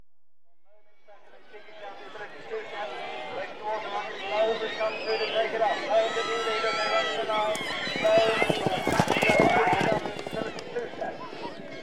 Point To Point Racing, Finish Of, Equestrian Events; BBC Sound Effects Library